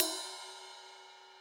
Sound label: ride, Millenium MPS-400